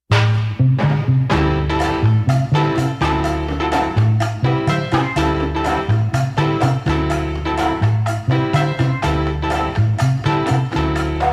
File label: boogaloo sabrosa